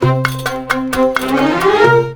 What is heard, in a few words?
Oiental; Best Service; Ethnic Journey Sound Cube